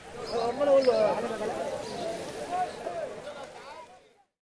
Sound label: market; PHARAOH